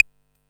CLAVE, drum kit; Polyend; Stazma & Concrete Collage; Concrete-55

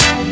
Beefy Hit; Proteus 2000; Digital Sound Factory Proteus 2000

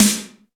SNR AMS SN, Snares; Roland - Rhythm Section

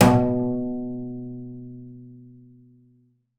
BING B2, BASS FINGER; Xsample VOL-5

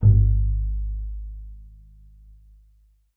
G#1, bass pizz; Xsample VOL-5

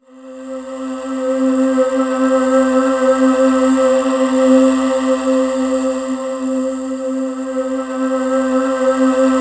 C5, Synth Leads

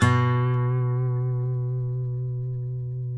COLLINGS B 2, Guitars; Spectrasonics; Hans Zimmer Guitars VOL-2; FNGSTYLE PFM

